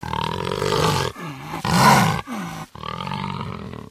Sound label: pseudodog idle